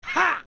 Whip; Ingo; zelda; OOT

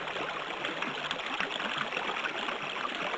Water; Zelda; OOT

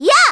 Flame; Zelda's flame attack (voice)

